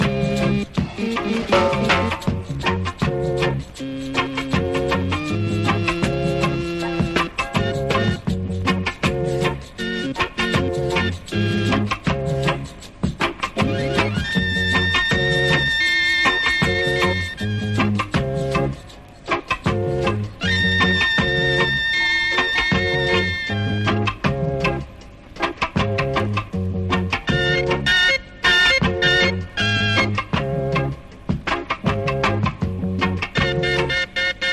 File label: Reggae Mood